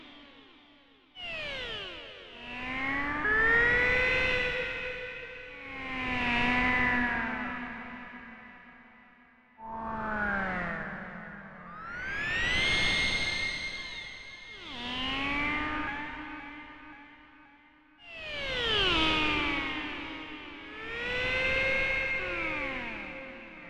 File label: whale noise